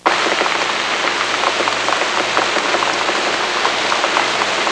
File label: rain in woods; Sound Effects for Multimedia - Volume 1